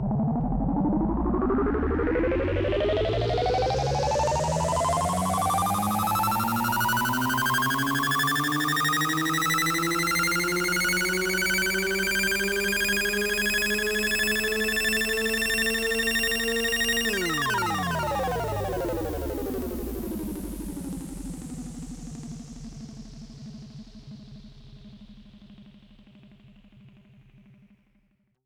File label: Uber Riser; M8; Electronisounds; Synth Prophet One Shots